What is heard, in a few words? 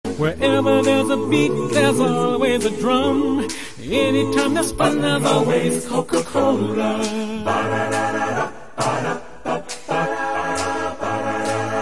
acapella; coca cola; the movies